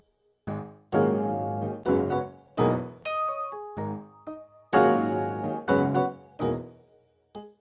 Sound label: 126 new age piano funk; KEYS ORGAN PIANO LOOPS